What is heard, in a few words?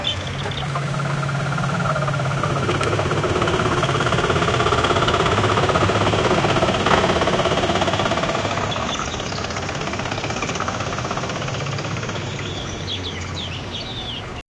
Indian farm tractor